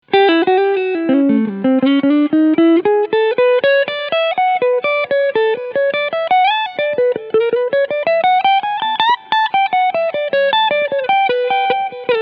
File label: FGN Boundary Iliad RHS Cleansound Humbucker